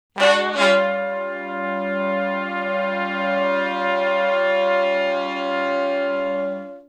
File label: Shuffle Blues End Chords [bII, I] (Eb), Horncraft; Sections; Crimson Blue and Fabulous Horncraft 4 RnB